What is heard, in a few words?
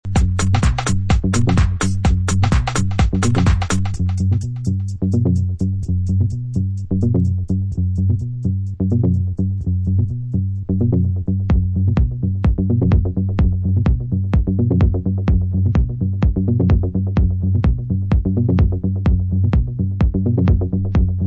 bass mix; techno